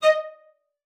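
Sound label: cello spiccato D#5, cello; Xsample VOL-5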